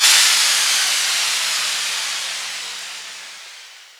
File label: swipe, Percussion, Swish